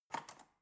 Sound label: cutlery fork drawer handle soft